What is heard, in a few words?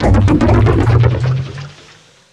alien loader; spawn